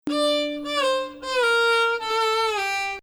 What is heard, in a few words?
Nahawand